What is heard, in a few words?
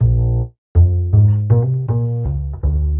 Standup Bass, Drum N Bass